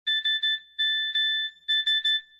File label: SMS Tone